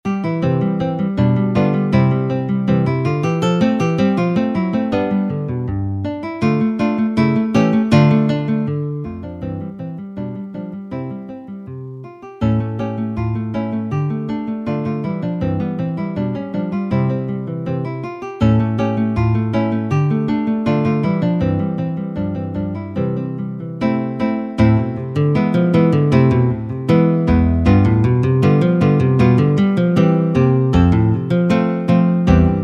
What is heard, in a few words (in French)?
Le clavecin de grand' mere